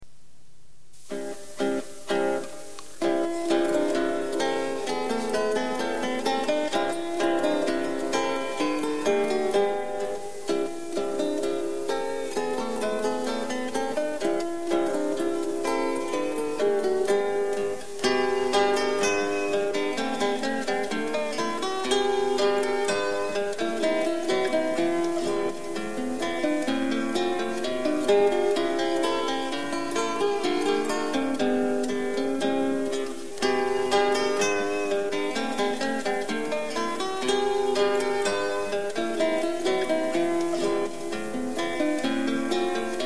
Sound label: gavotte, guitar